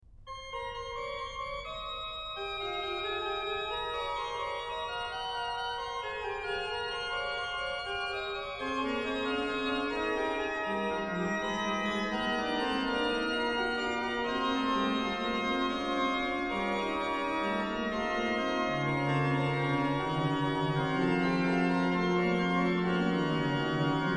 ORGAN, Chorale Preludes; Kyrie, Gott heiliger Geist BWV 674; BACH; BACH ORGAN WORKS

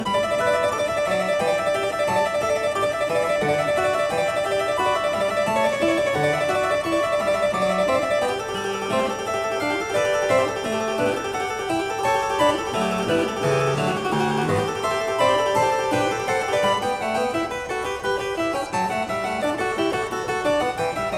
Variatio 8 a 2 Clav, Cembalo; BACH; Goldberg Variations

